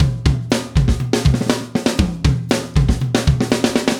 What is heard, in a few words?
2 bar tom loop